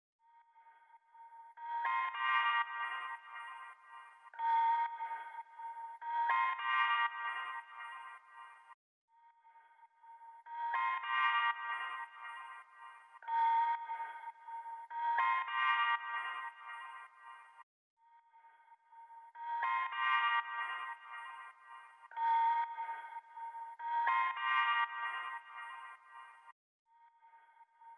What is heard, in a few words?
108 BPM A Min Texture; Cymatics; 2022 Melody Collection; Wavy; Space Invader